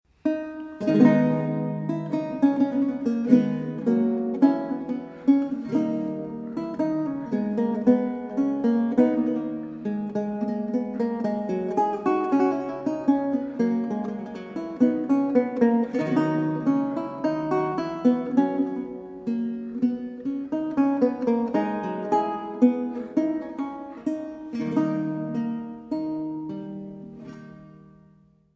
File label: Losy Allemande